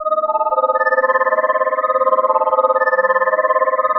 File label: Spider Drop, Synth Textures; Sonic Foundry (Sony Creative Software); The Electronic Music Manuscript Collection